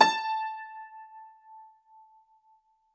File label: Grand Piano, Kawai, Sustains, A4; Chordophones; Legacy